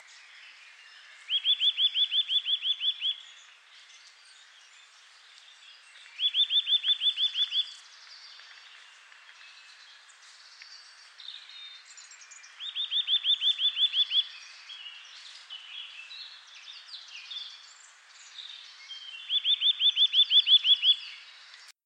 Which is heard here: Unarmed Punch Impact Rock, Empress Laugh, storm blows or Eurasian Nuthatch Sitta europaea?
Eurasian Nuthatch Sitta europaea